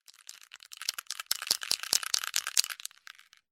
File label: dice